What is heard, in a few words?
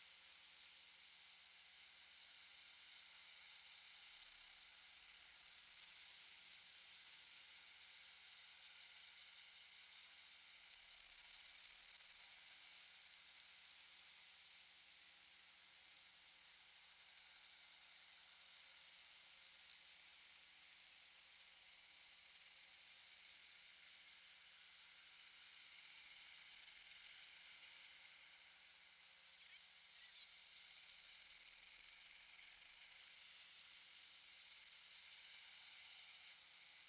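pacific white-sided dolphin; salish sea; old humans cant hear these well; not yet heard locally but present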